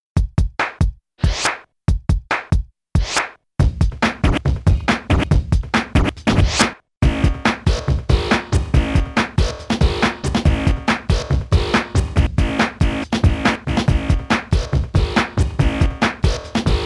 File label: buzzin synth, bed